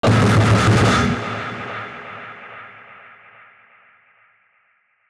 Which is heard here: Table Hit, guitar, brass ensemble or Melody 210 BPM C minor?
Table Hit